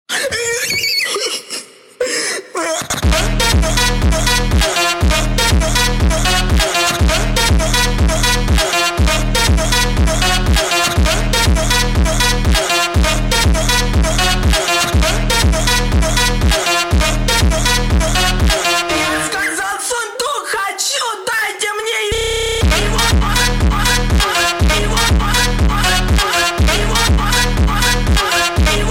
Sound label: Funk sped Up